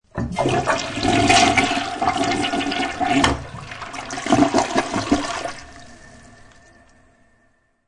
Toilet Flush, Flushing a toilet.; Bathroom; Household; SFXsource

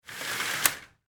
Household Window Curtain Close; Cleaning

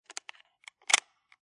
Pistol Revolver Chamber Opened; Gun Handling

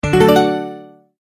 multimedia tone advance; McKinney Sound

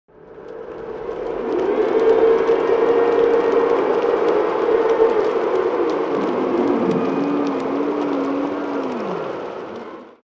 Crowd Cheer And Applaud - Short - Large - Inter; Interior; Applause

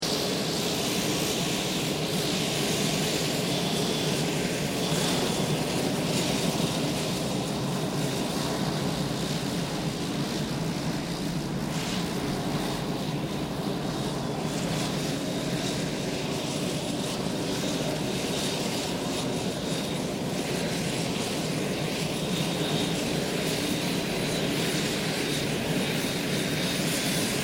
unknown turbo prop idling; Various unidentified aircraft; Airplanes; Vehicles

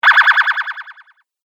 Game App Magic Forcefield; Video Game Sound Effects

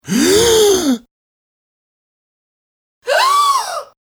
Male and female gasps; Gasping (Sound Effects:Voice)

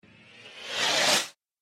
Subtle Whoosh Build Up Zoom

